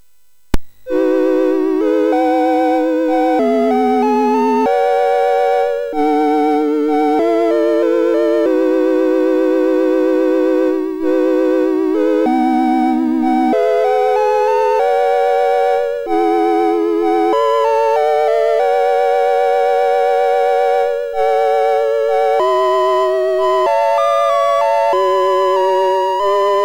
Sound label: sid, MOS6581R3; Utter Ego Demo